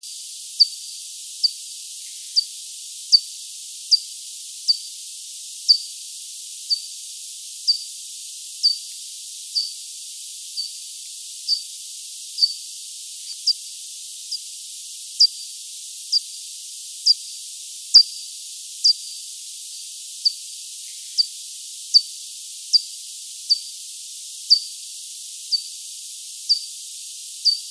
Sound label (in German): Zwerg Ortungsruf verlangsamt